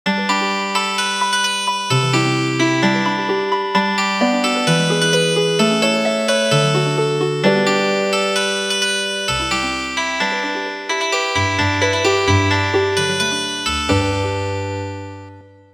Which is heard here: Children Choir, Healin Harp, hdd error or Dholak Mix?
Healin Harp